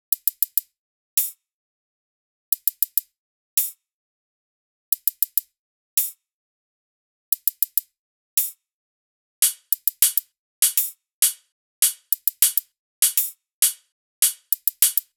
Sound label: loop hat 100; Seismic Loops